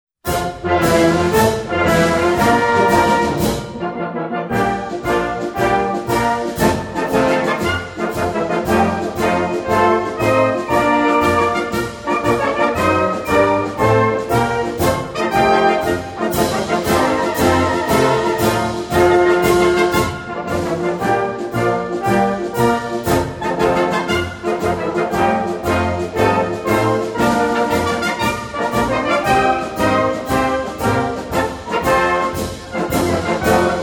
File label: Marching Escort